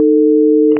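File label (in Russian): синтезатор одиночный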